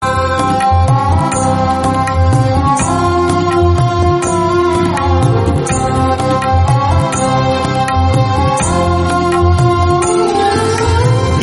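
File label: sarangi, temple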